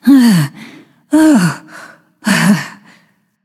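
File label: nonverbal breath; smite; voicepack; izanami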